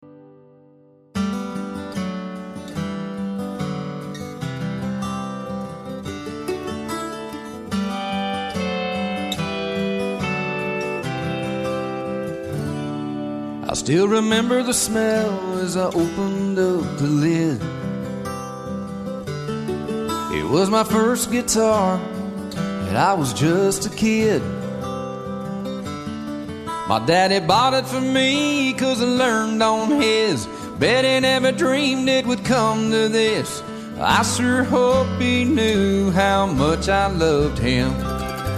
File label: first guitar